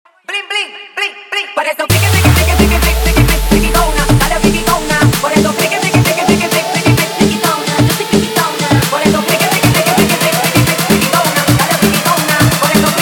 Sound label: intro outro 130 bpm